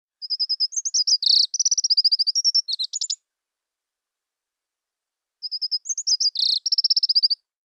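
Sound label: Japanese accentor song, birds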